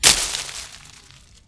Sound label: bush; Mafie 1